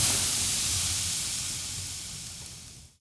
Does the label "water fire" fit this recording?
Yes